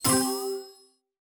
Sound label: panther collected